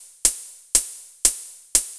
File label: Hi-Hat, EDM; Sonic Foundry (Sony Creative Software); Afterhours EDM